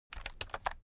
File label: keyboard external shortcut medium